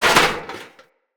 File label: Battle Dog Helmet Destroy Chunk; Snc Battle Dog; Foley